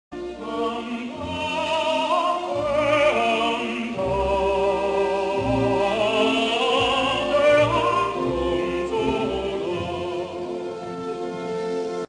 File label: tenor